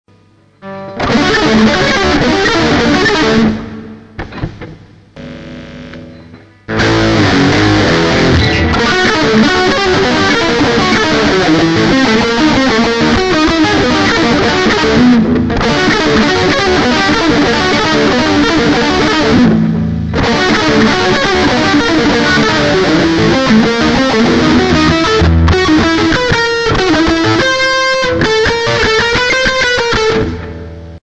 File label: sweep